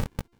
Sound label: Short Sword Perfect Wind Up; Weapons; Player; Swords